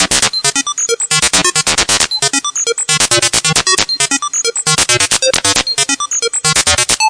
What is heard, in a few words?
sqweeky, synth and electronic loops